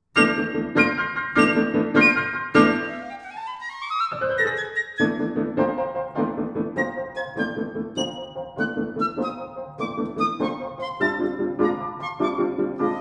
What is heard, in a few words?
Sonatine allegro vivo; J.Feld